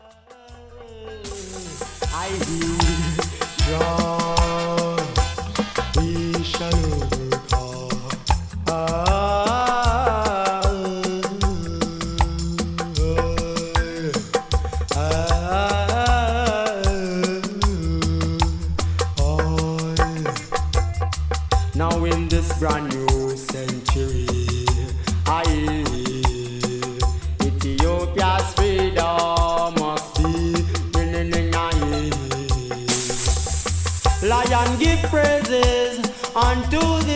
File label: Drums